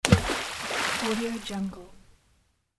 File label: stone dropping in water